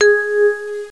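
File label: note A flat 3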